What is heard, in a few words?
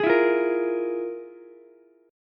F Minor Major 7th Double Flat 5th, Ukulele; FmM7bb5 strummed; JGuitar